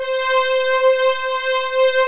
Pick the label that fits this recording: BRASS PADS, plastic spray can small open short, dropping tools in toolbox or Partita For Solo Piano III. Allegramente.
BRASS PADS